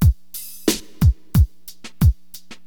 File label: Drums loop, HipHop; LiveAct Pool 1